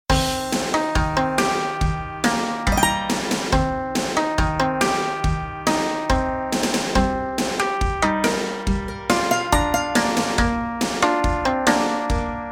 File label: Music Menu; ninjin clash of carrots